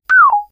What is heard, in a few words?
high Down